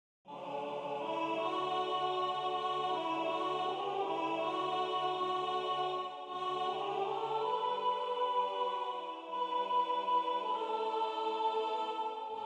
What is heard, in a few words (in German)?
Sopran